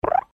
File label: Popup